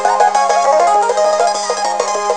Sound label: radio western; Amiga Soundtracker Sample Packs